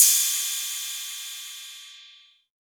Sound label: Cymbal, Novation Drumstation; DrumstationV1